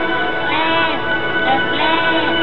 plane

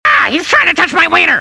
ahh

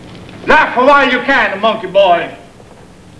laugh

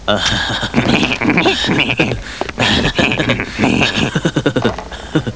laugh

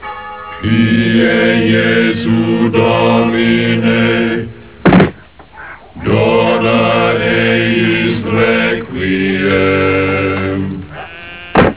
monks

